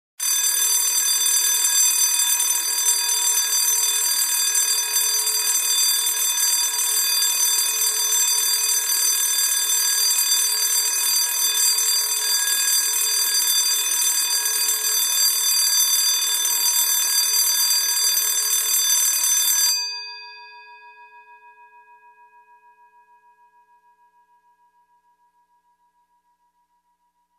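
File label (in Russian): электронный звонок пожарной сигнализации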